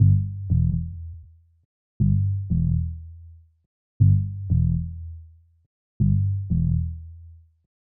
Bass Loops 120 Dmin

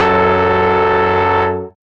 Fat Synth F3

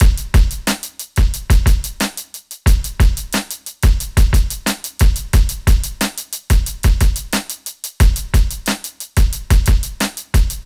Drums 90BPM Acoustic RNB

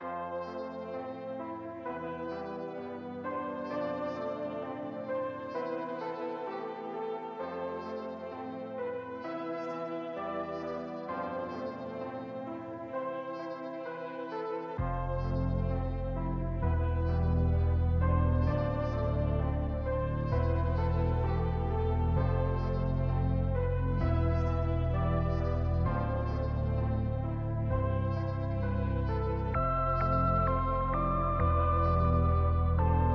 130BPM Am Major Loops; Penthouse

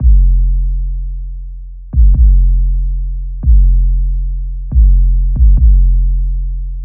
140 BASS LOOP F

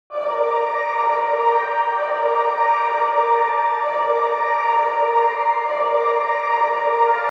Pluck Clean Kalimba

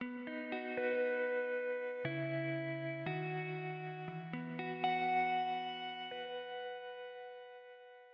B 118bpm guitar

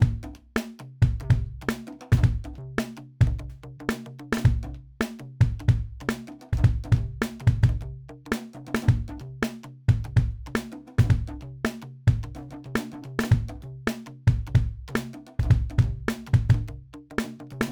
Upbeat Kick, Snare, Perc

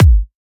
Kick Shot

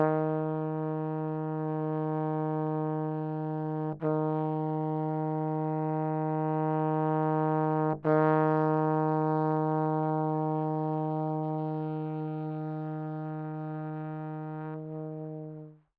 Trombone, pan right, 120bpm, D, dark horror

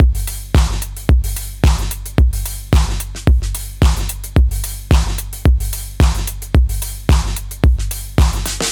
110 drums

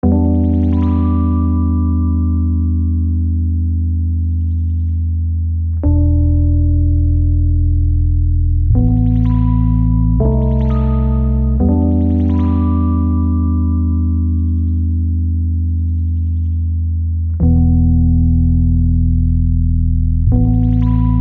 Synth Loop WET 83 Em

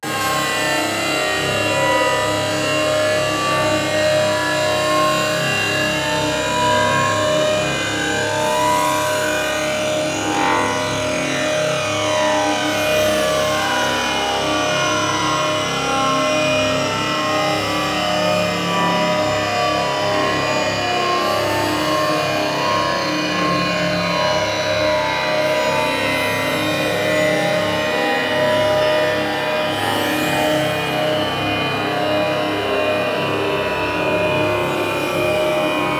Abstract Atmosphere

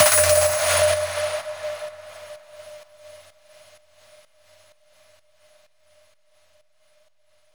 FX HITS

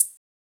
Shaker

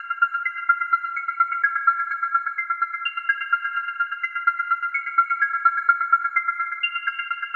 Synth Loop 127bpm F m

